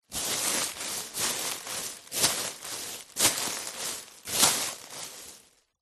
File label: plastic packaging sheet short handling movement stuffing; foley